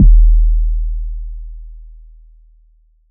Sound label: puffy kick drum sound d sharp key